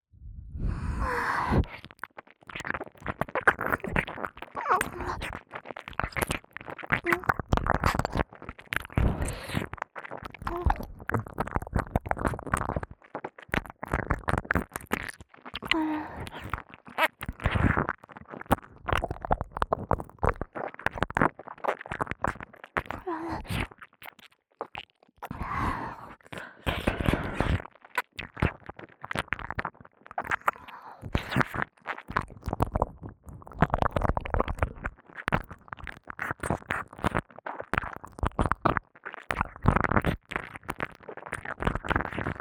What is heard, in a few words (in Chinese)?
(右)舔耳 不断舔弄; 舔舐声特化; 双耳立体声